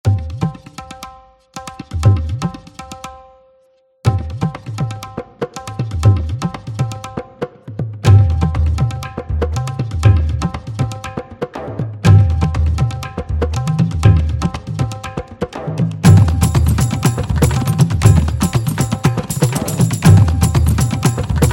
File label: Indian Percussion